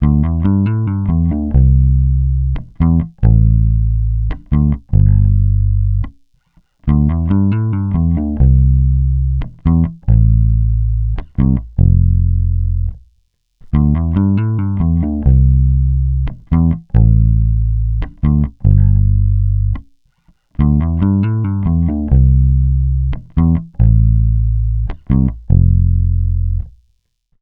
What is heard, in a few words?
Bass loop 70 C, Electric Bass; Electric Bass Loops; Slate Digital BASSHOLE Sample Pack